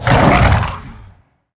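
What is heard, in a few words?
human big male attack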